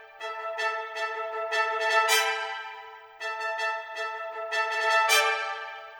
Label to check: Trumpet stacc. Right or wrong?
Right